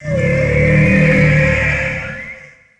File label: dreadshark hit; DAoC; Toa; Gamestar